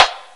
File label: hand clap sound f key; percussion; single hits one shots